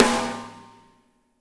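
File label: snare left; Spectrasonics; Burning Grooves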